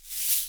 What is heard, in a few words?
SHAKER, Percussion; Miroslav Vitous - Percussion & Harp